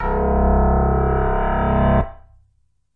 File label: Moo Piano C1, moo backwards piano